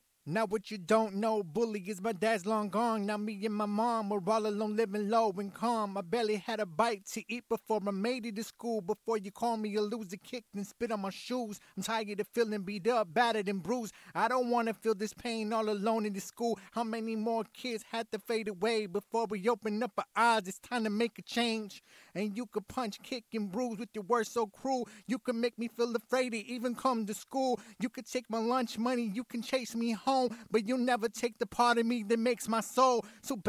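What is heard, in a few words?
hip hop 60 Am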